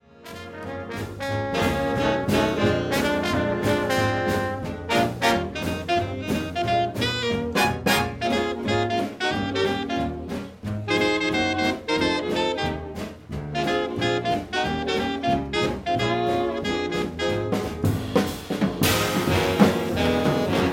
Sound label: Orchestra